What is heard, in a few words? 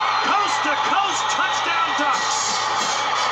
Touchdown; Oregon